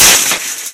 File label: metal chainlink impact hard, physics metal